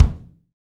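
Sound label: bass drum, Roland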